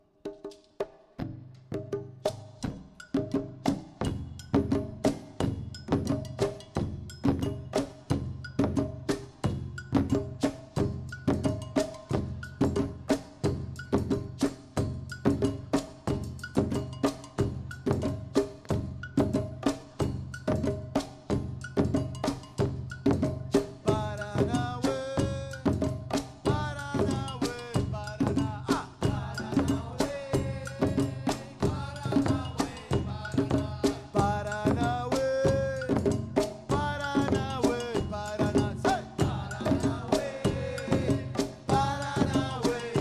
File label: drum Song; World Music